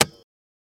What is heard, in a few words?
Bingo Light Switch; switches